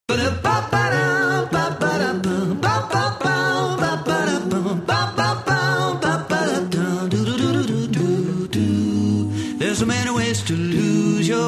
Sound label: jingle